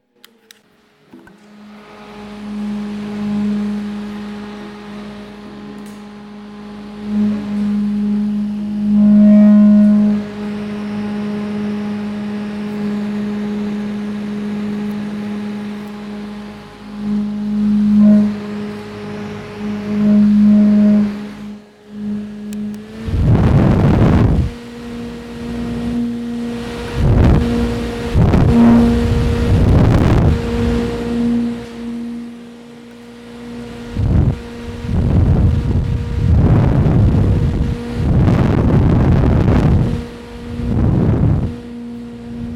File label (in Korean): 선풍기 소리